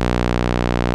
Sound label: ARP 2600 B2, synthesizer; Hollywood Edge - Giorgio Moroder Rare Synthesizer Collection